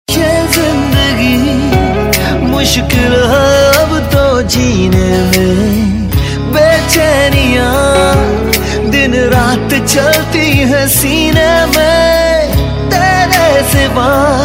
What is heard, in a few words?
Male Version; Star Plus